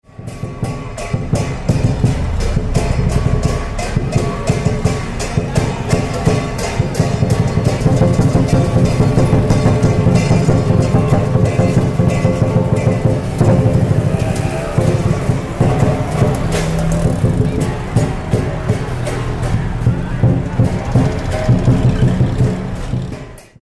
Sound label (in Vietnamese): tieng chieng trong ngay tet co truyen